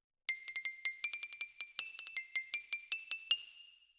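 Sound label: Xylophone Close, Jazz for piano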